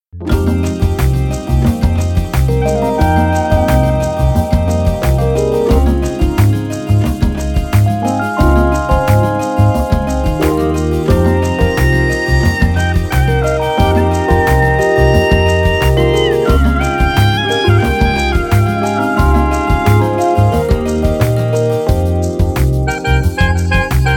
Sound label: crossbell looped